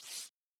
Rip Up Paper

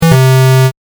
correct; シンプルなピンポンの低音ver; 正解の音・ピンポーン

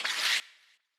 paper

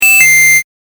correct; ちょっとゆっくりのピンポン超高音ver; 正解の音・ピンポーン

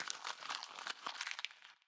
Crumpled Paper

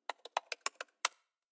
Keyboard

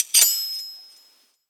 ping

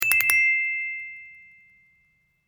correct; 鉄筋を使ったピンポン×2回・中音ver; 正解の音・ピンポーン

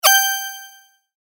sword

